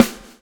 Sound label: swing